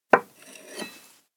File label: glass place gimlet; ken; anemoi パッケージ版 1.1